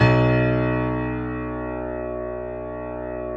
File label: JAZZ B0, Keyboards Acoustic; USB Soundscan vol.17